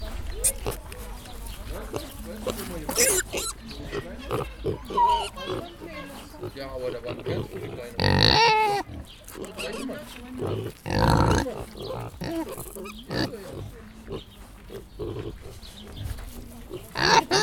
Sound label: Pigs In A Zoo